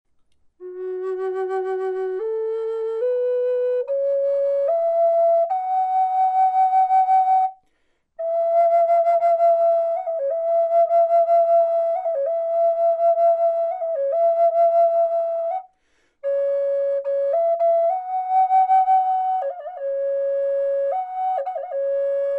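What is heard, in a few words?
natural, wood flute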